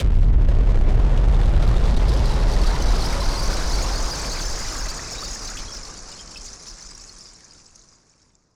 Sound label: Noise Bomb Rumbler; Noise Bombs; musicradar; bomb factory